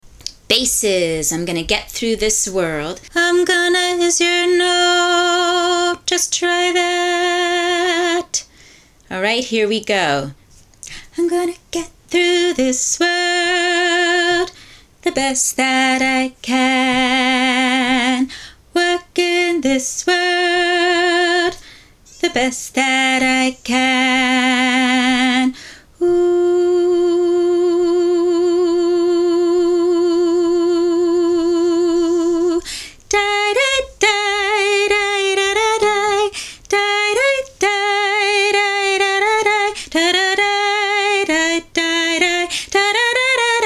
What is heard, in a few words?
Gonna Get Bass; all the way through